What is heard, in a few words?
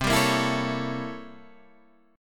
C 13th Flat 9th C